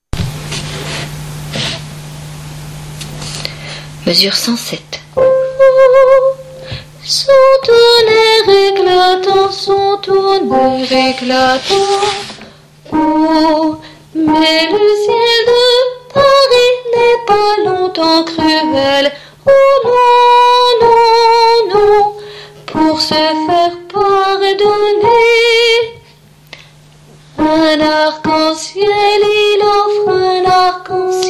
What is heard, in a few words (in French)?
sous le ciel Basse suite et fin; mes 107